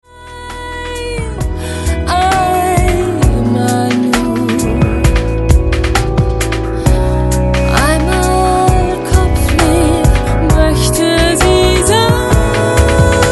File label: solo; Bass played by Willi Schilling